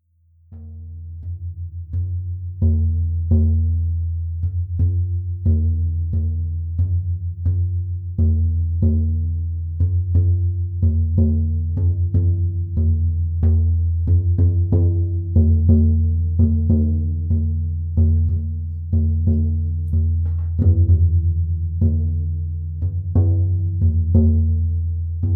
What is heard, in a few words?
coredrum